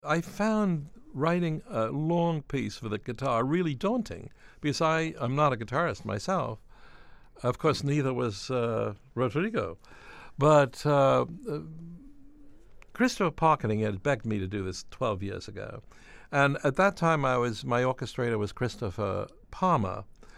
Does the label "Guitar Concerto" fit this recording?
Yes